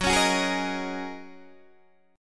Gb 6th Add 9th, Guitar, DADGAD; chordshape; down

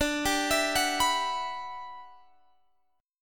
E Minor 9th D, down, Guitar, Standard

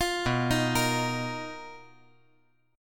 B Major Sharp 11th, 5G,4C,5E,5A

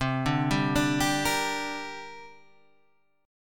C 7th Suspended 2nd

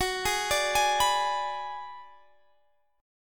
Ab Minor 7th Flat 5th Gb

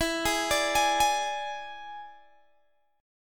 E 7th E down Guitar Open G

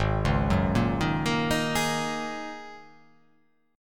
G sharp 9th Suspended 4th G sharp

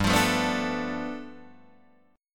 G 9th, Guitar Open D

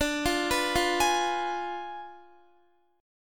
D Diminished 7th D down Guitar; Ddim7 arpeggiated

